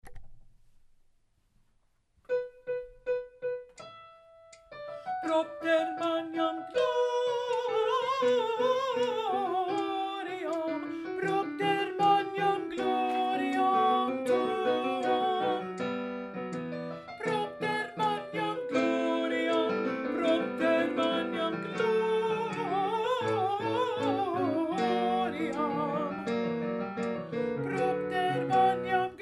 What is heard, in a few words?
Fast Alto; Propter Magnam-Fast